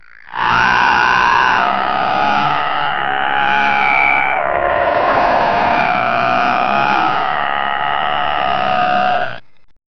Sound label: a big brown grizzly bear